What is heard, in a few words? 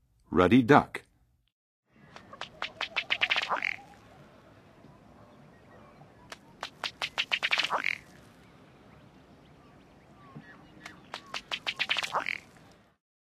Ruddy Duck; North America; Western USA; Western USA Songbirds